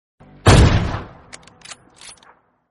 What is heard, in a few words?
kar 98 gun sound